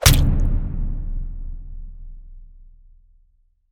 Killing; Among Us

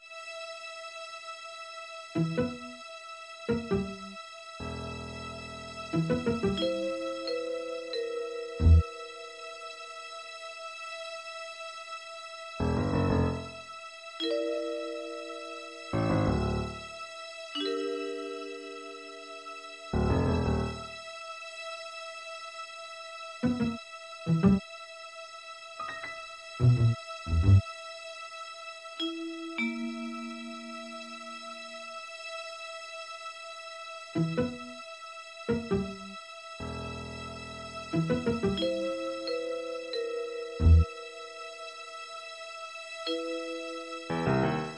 Orchestra - Tension; The Operative No One Lives Forever

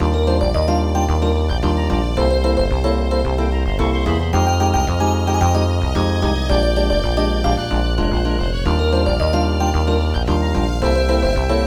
BGM Beat Machine; Rhythm Heaven; NDS

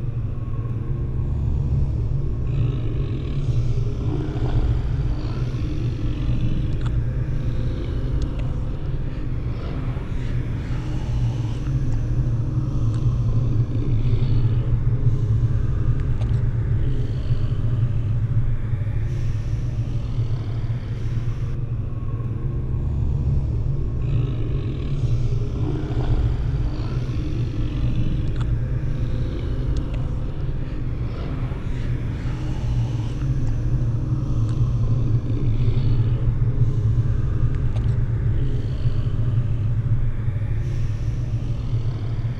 Palace ambience; Fate 4 The Cursed King

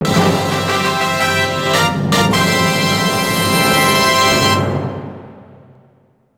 Race Goal Fanfare; Mario Kart Arcade GP

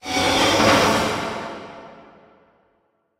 Sword Drag; silent hill homecoming; ps3

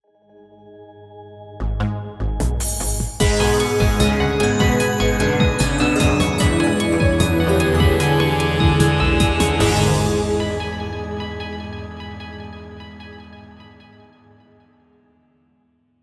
Intruder Confirmed; gun point; Crosswire (Unused)

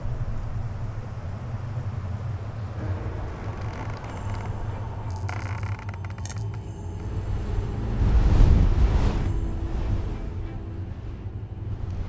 Ambience; Frost Plushtrap; Five Nights at Freddys; FNAF Special Delivery; android ios

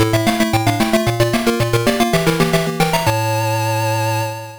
Round Clear; Shinobi II The Silent Fury; Game Gear

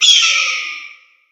Eagle Screech; angry birds

